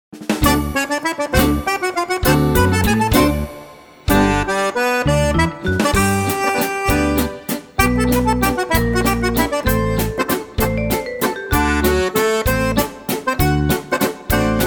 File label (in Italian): SEMPLICE VALZER; Nostalgica Balera